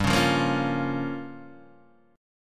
G Minor 7th Flat 5th, Guitar DADGAD

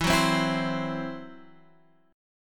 E Suspended 2nd Sharp 5th E, down

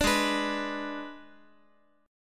Ab Diminished, Guitar; strummed; 4A,4E,5A,5D,5Gsharp,6Csharp; 17,16,14,x,x,x

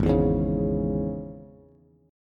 Ab Suspended 4th Sharp 5th Bass; strummed; JGuitar

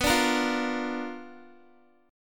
B Minor Major 7th Flat 5th Guitar; strummed; JGuitar

